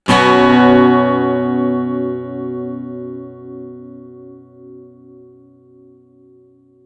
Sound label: Guitar 128 BPM Bmin, One-Shots; Ghosthack Advent Calendar 2021 - Day 10; Guitar Loops and One-Shots